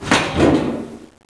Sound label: tube